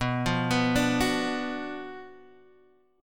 F sharp Major 7th Suspended 4th Sharp 5th, B, chord